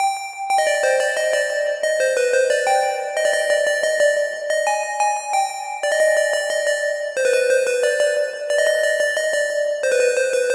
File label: Lead; Lead - Aura; Routed Sound